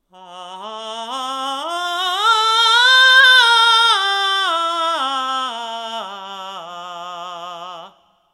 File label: acuto